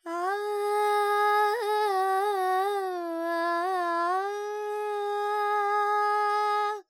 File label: Charukesi, Vocals, Carnatic Female, Alaps, G, Dry